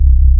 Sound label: Power Hum Loop; Playable Tutorial Level; TR3